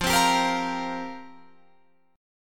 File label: F Suspended 2nd Suspended 4th F down Guitar Drop D